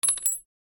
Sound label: cart